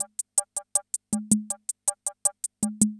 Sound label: CR78; Best Service; XX Large Most Wanted